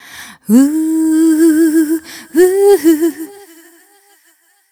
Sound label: f mel c, fem Vocals; Mutekki Media - Diamond Vocals 2